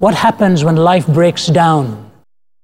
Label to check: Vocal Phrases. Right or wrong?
Right